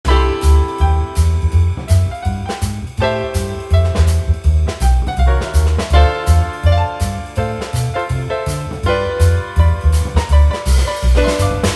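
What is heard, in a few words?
background music collection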